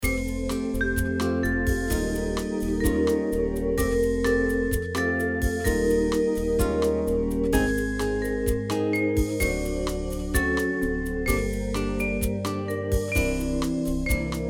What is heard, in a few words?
Walking Bossa Underscore